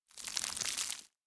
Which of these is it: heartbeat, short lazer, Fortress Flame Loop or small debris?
small debris